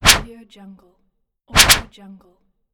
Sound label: the swipe